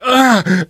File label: hurt major, survivor voice